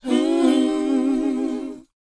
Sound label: WHOA A#, Vocal R&B & Dance; ILIO; Vocal Planet